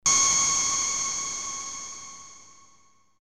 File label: sonar